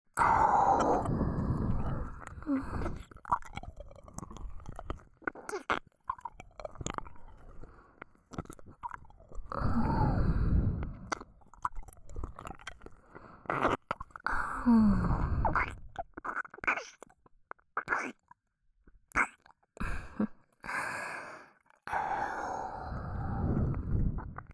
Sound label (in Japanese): 耳舐め→手コキ耳舐め, ASMR; アドリブ集; えちえちダンサーのイッても止めない無限腰振りセックス～クラブで酔わされ朝まで持ち帰り逆NTR～